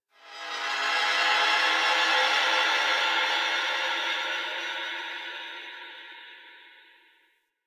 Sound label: Exterior Tonal Textures; Ambience; Warframe; Railjack